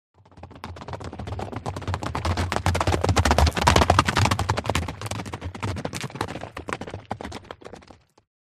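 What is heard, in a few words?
By 2 Horses Canter Past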